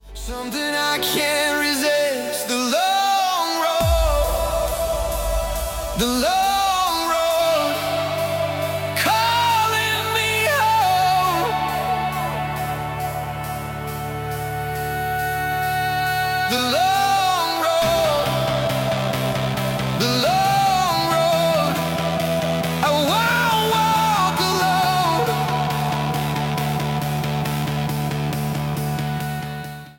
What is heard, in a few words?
hardstyle remix